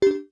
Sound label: Save Menu Confirm Cursor